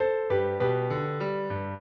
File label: trio, piano; Mozart